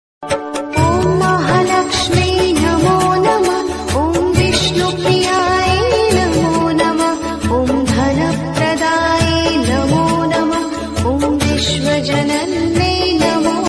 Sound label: mantr ringtone; lakshmi mantr